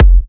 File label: Kicks F; markus hakala